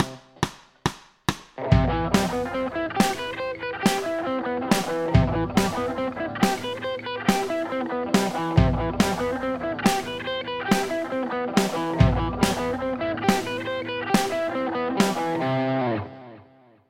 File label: scale